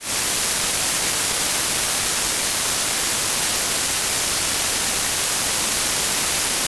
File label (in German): weisses rauschen; harmonie